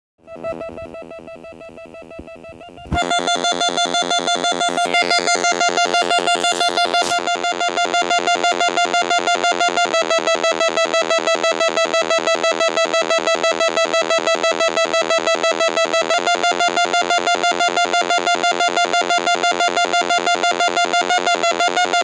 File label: synth PROPHET